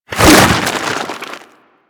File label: Arm Attack; Warframe; Infested; Ancient; Attacks